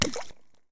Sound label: water bullet impact